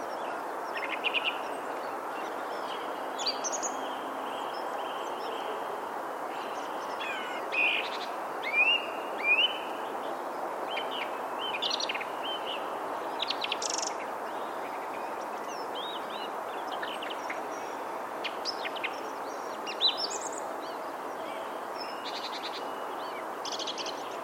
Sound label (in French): merle noir friche; ville evrard